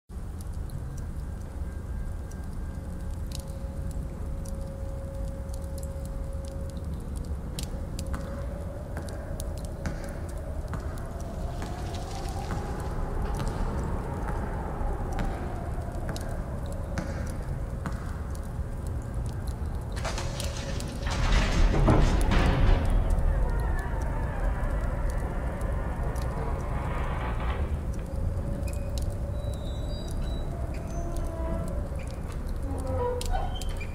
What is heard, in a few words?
Castle Ambience